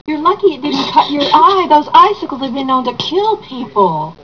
icycle

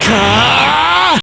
shield; gohan

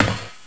footstep metal grate

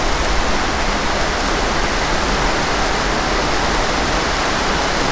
rain night

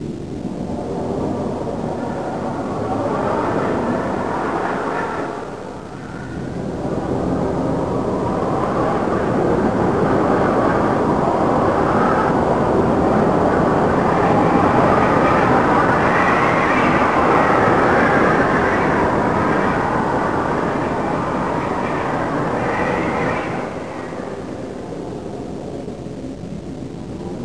city cold wind

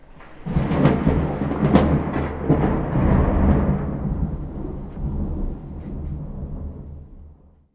lightning

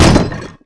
box metal impact, debris

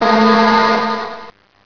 alarm

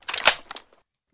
crossbow mag in, weapons

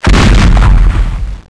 snipe fire

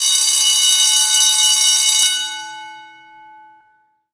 alarm bell

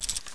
saw bullets shake

